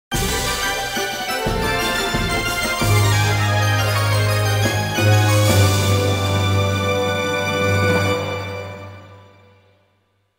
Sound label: Duel Victory; Yu-Gi-Oh! The Duelists of the Roses; PS2